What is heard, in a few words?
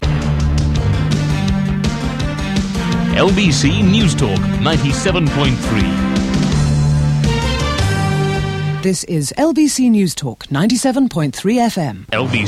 newstalk jingles; lbc